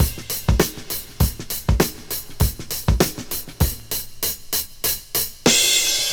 78 bpm 00s drum loop c sharp key, kick tuned to the C# note